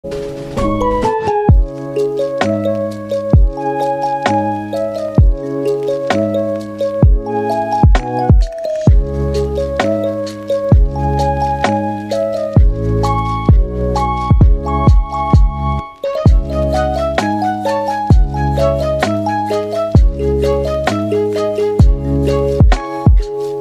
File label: Lofi; Attack on Titan